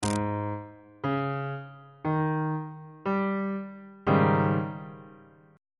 a flat major 7th suspended 4th chord on bass clef

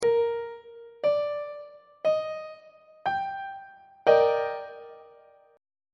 e flat major 7th 2nd inversion on treble clef

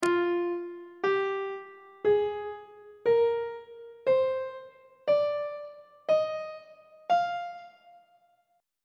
e sharp dorian mode note positions on treble clef

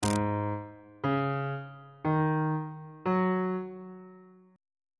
a flat dominant 7th suspended 4th chord intervals

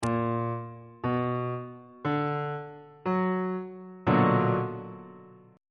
b minor major 7th 3rd inversion

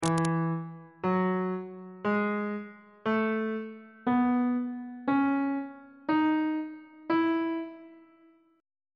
d double sharp major scale note positions

